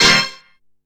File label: Stabs